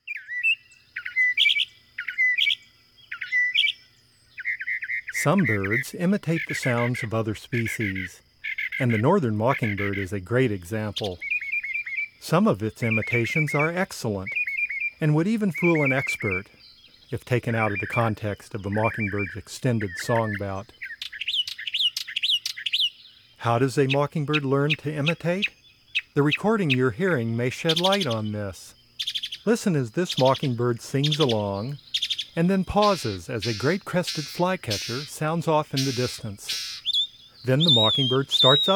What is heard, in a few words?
Northern Mockingbird; North America; Common Western Species; Know Your Bird Sounds